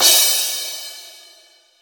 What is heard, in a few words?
Crash Cymbal